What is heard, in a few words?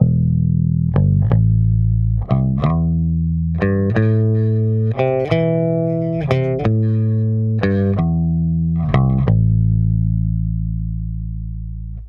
bb735a ACTIVE flat